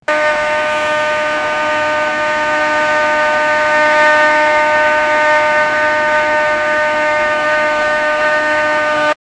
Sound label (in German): presslufthorn, air horn